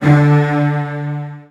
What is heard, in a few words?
D2, Virtuoso Strings, 16TH; ILIO; Kirk Hunter; CL 16TH